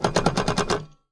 Typewriter

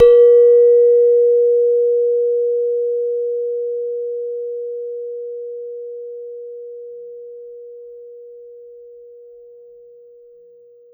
Gender, Gamelan

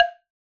Wooden Bar Hard Strike

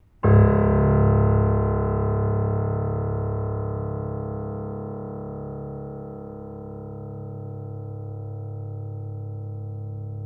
Note, Piano; Piano Miscellaneous

